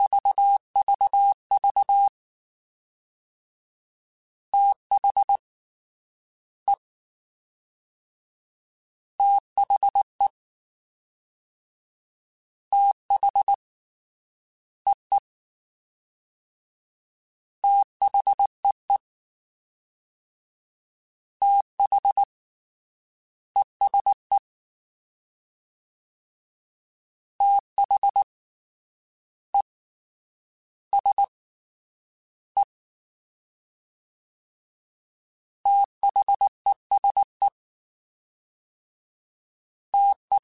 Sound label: Fast TH, CW Code Groups; Mixed; Prefixes